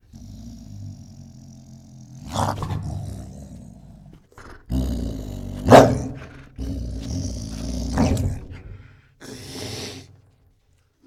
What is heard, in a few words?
Reactive Tiger Makes Angry Roars and Snorts; Tigers; Sound Ideas